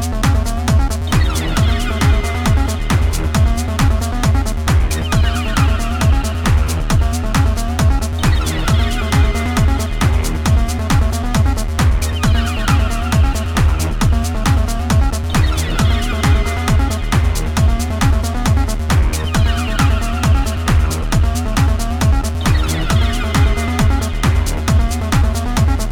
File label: Enemy close